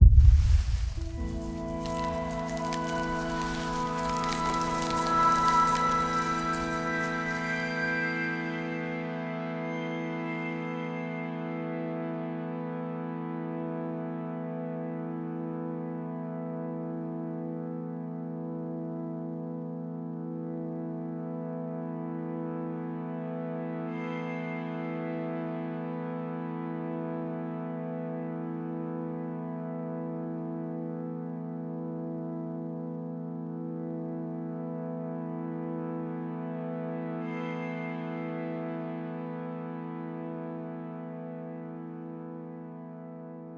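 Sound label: trees grow sequence E sustain; lvl trees chunk3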